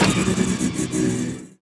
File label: hipcutter idle end